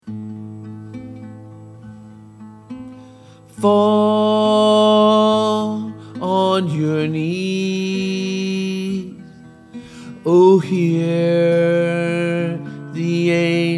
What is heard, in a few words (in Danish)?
SOPRAN